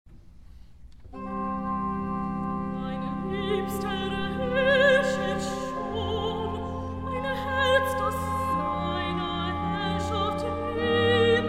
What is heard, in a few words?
Alto Reitative, Cantata; Bach Christmas Oratorio